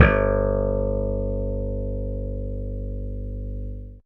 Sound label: bass G2, Bass Slap, AKAI S-612 + S-900